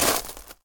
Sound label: rocks step; Original TK Sounds